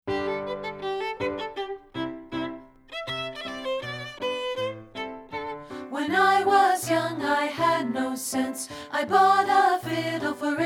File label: PT2; two part; partbypart